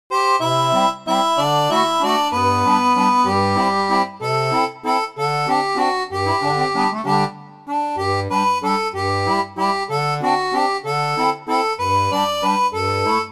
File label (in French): seraphone, orgue barbarie